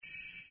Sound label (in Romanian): cadou; santas gift jump